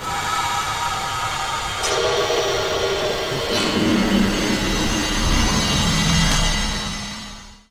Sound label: TRAIN FX; Best Service; Advanced Mega Effects